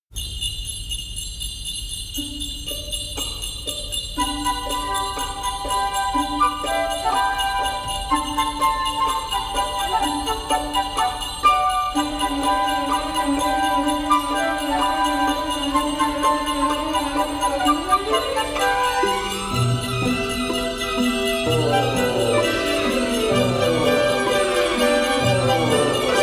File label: Orchestra Jingle Bells